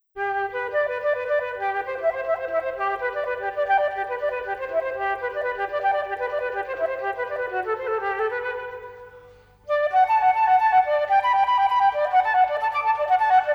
Solo Flute; Telemann; TWV40-12

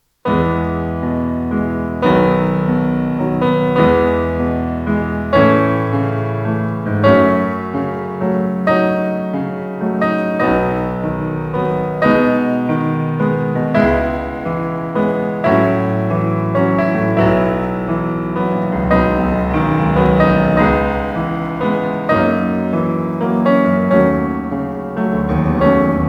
Preludes; Chopin; Op28; Lympany

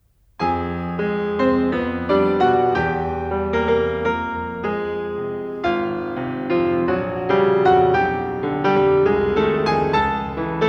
Carnaval for piano; Schumann; Op9; Rubinstein

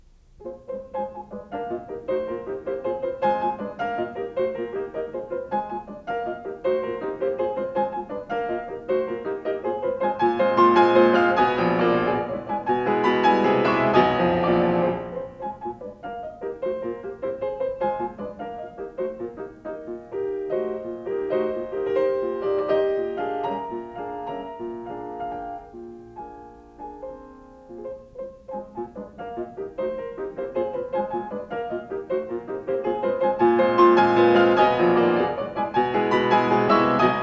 Carnaval for piano; Schumann; Op9

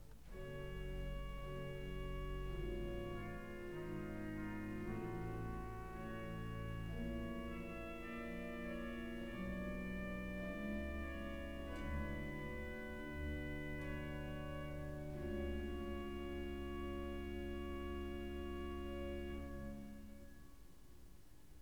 Organ Concerto; Handel; Op7-2; HWV307

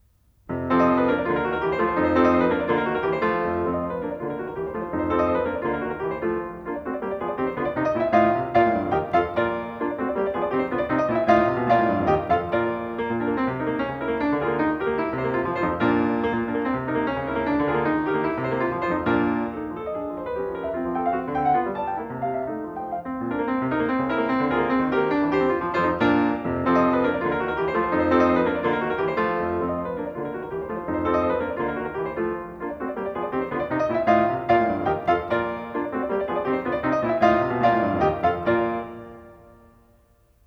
Carnaval for piano; Schumann; Op9; Rubinstein

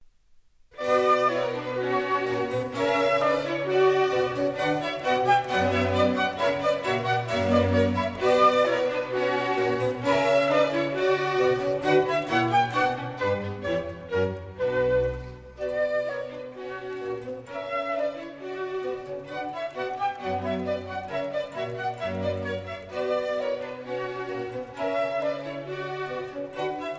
Bach Orchestral Suite; Orchestral Suite2

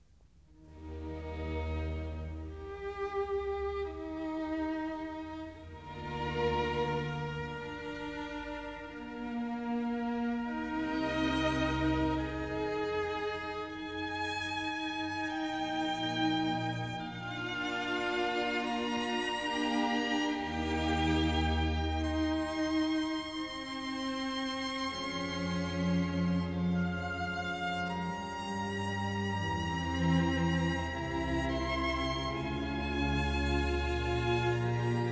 Organ Concerto; Handel; Op4-3; HWV291

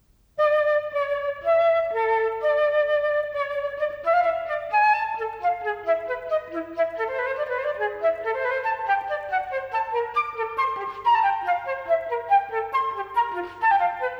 Solo Flute; Telemann; TWV40-7

